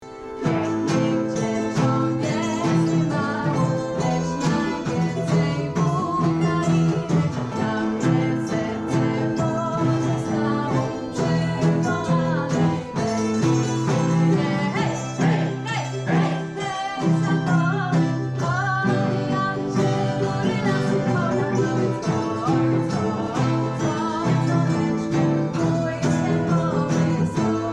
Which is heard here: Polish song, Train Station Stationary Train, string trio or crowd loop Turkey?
Polish song